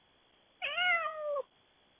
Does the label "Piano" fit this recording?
No